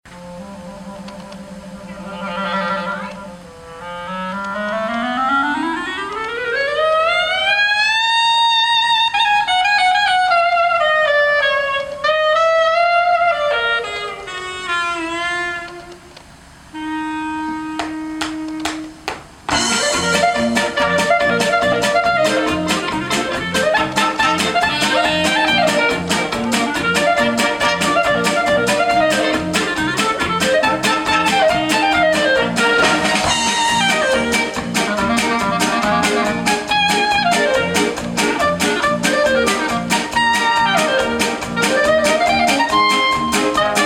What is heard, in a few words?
Clarinet Polka